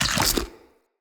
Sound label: Bite Small Impact Flesh; Weapon Impacts